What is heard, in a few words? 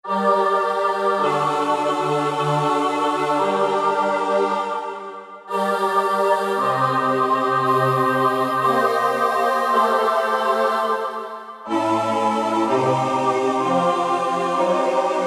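Korg MSC-1S Stereo Voice; Stero Voic; Korg MSC-1S Variety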